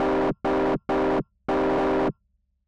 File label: Distortion